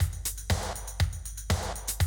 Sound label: 120, Classic Drum Loops